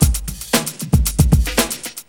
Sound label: BEAT, Beats 113-123bpm, Hip Hop; E-MU Formula 4000 Series Vol. 1; Hip Hop Nation